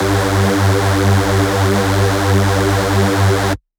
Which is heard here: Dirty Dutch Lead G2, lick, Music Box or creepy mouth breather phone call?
Dirty Dutch Lead G2